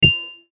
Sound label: chime low bass, Chime Low Bass Reverb; Chime Low Bass Reverb Production Element Imaging Element Accent Transition; Multimedia; Chimes; Blastwave